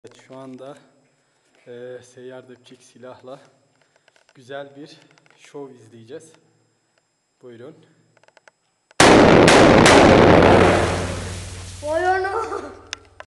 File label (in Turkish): silah sesi